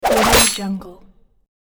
ninja throw knife flesh; dudesound

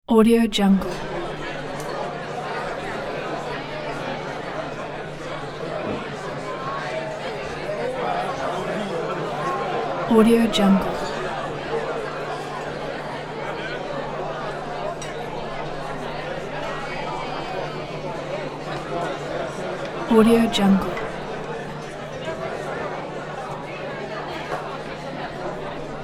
busy night club or bar ambience; sound-ideas

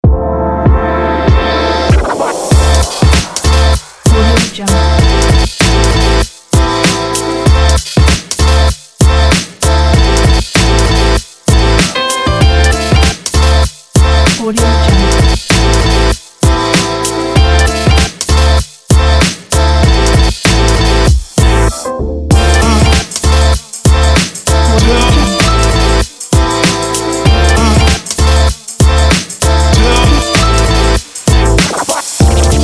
hiphop

